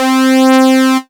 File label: Kawai K1r Analog Lead C4